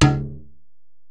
Ac Perc, Drum Machines Alesis DM-PRO

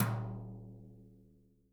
BRUSHES, Living Drums; Sampleheads; Peter Erskine Living Drums

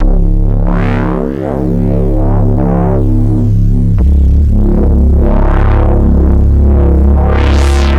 NRG Bass, Trance

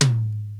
TOM; Crypton - DrumBase5000

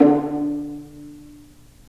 Vas Pizz, String; Roland - String Master Series

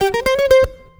guitar, stringed instruments

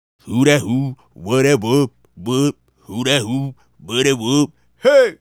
Male Solo, Hip-Hop and RnB Vocals

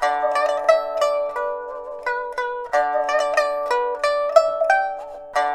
PIPA, 90; INIS - Opium

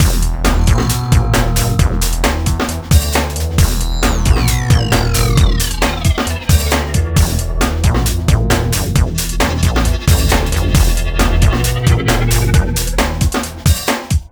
Mellow Jack, 134 Emin, Hardcore Breakbeat; Big Fish Audio; Hardcore Breakbeat Action

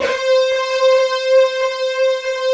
big disto; East West - Global Sounds

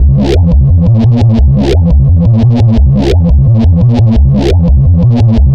Convoy Bass, Drum n Bass; KJ Sawka; Drum n Bass & Beyond

